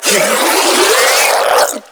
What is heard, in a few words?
Infested Ancient Melee Mid Spin Scream